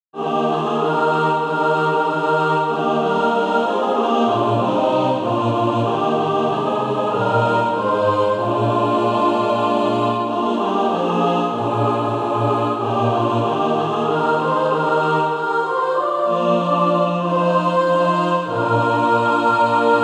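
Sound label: mixed, Practise Music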